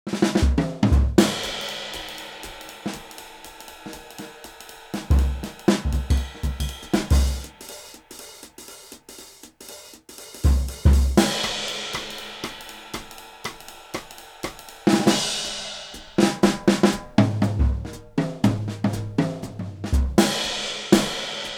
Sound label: jazz drums; classic jazz drums; kontakt; authentic soundware